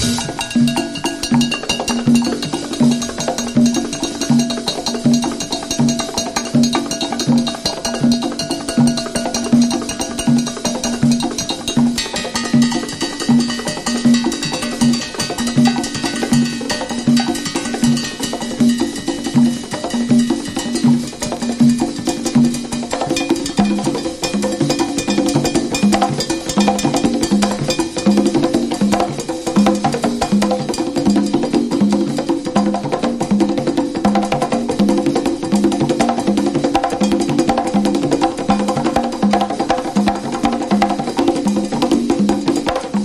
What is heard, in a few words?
TALKIN DRUMS; EXOTICA